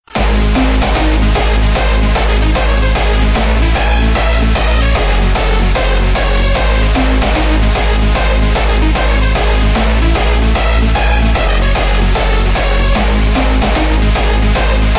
Stringbass

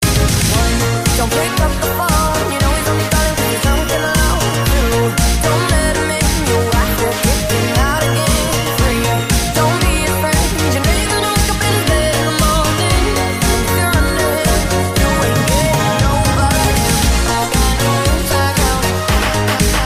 80s Remix

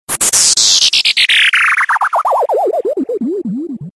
Sms Lazer

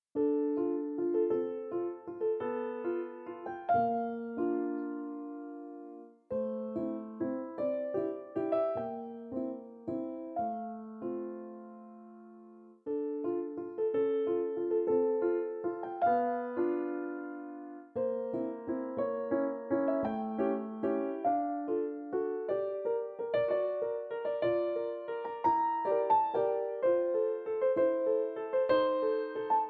Lullaby

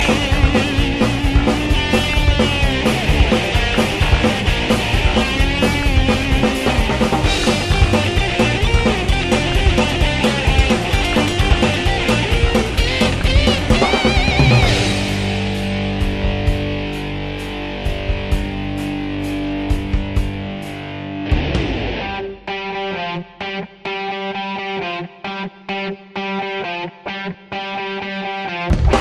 Pop Gitar

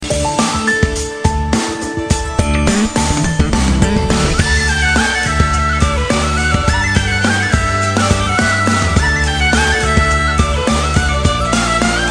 Melody

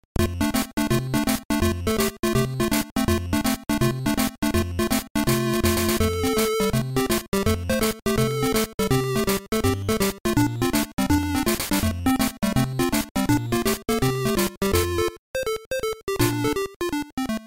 Tamagotchi; GB

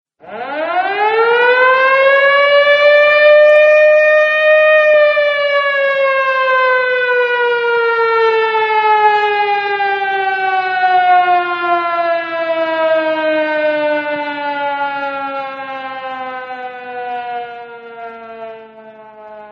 War Alarm

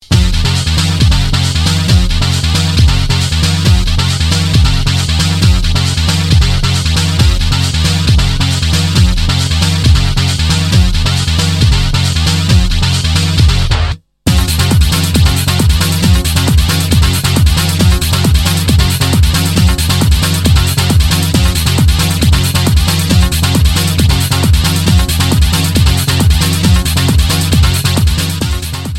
Hard House

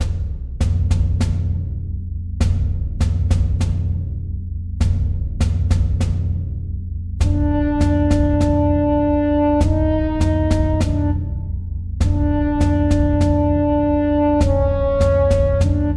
Clavelian March

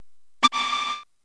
Buzz

